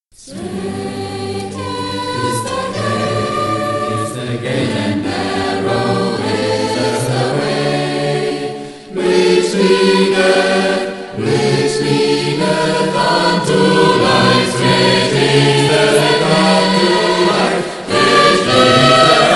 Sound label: choir; Strait is the Gate